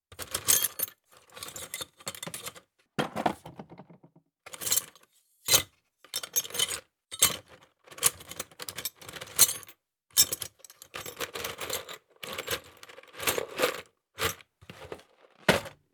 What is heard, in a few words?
box looting; Escape from Tarkov